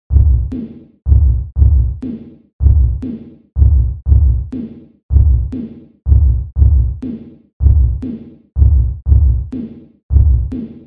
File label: sinewave